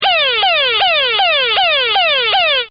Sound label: Siren; Sirens; Electronics; Partners In Rhyme